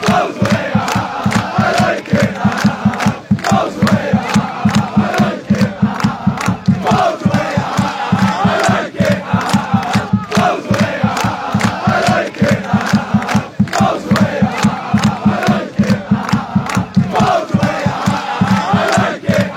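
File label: bohs away aha aha, football chants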